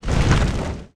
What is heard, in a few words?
Action Large